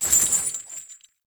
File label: Rock Trap Chain; Objects; Ode Lantern Crate